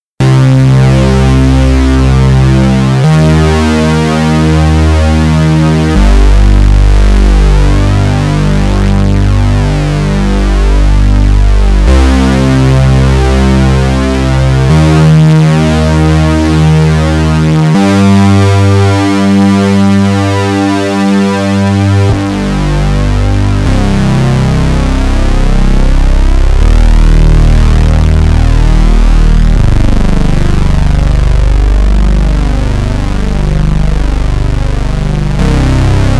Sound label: jupiter4 unisono